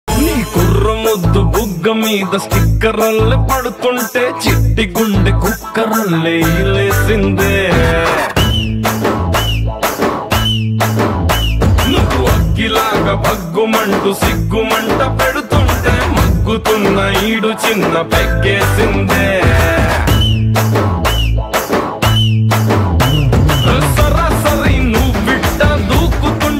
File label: telugu ringtone